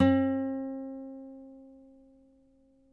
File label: NYL NV D, nylon no vib guitars; Spectrasonics; Hans Zimmer Guitars VOL-1